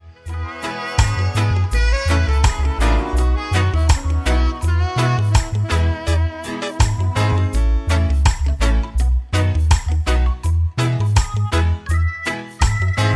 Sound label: Sax On the River